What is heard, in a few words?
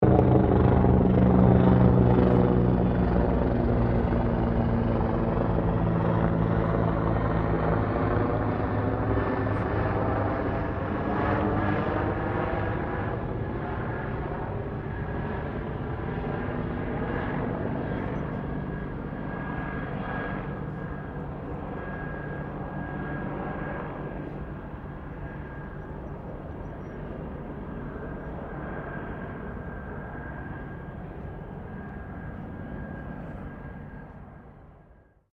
HELICOPTER 1967 BELL UH1 IROQUIOS FLY OVER; RAN UH-1 Iroguios; Helicopters; Vehicles